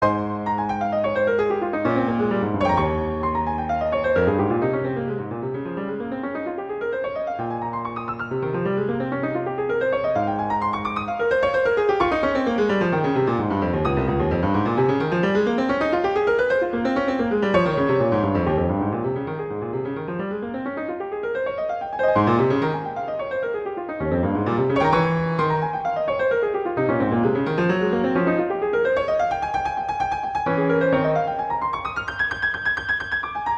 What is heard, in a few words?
Exercise - Ab major; Clementi; Preludes